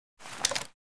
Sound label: pull out; Titanfall 2